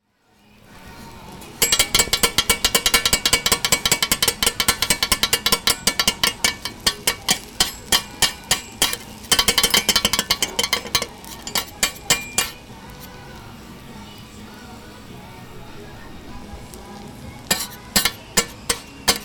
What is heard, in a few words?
monjayaki; もんじゃ焼をやく音; Chino Cultural Complex; 茅野市民館